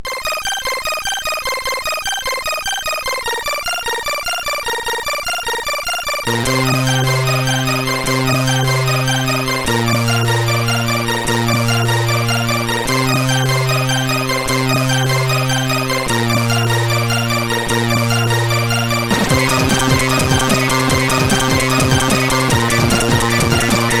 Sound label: Flatline tune, sid CSG8580R5